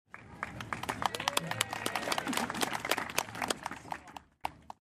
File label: crowd cheers applause